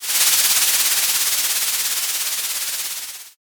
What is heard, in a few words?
frying